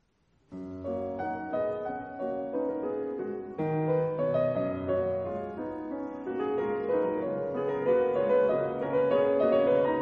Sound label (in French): Quintette